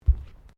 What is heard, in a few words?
Footsteps Bare feet Tiles Step; Footstep Foley; Footsteps (Sound Effects:Human)